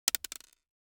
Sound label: DICE on Hard Wood, Throw and Roll, Mini, 1 One Die; Samples; ArticulatedSounds; DICE Sfx Party (Extended)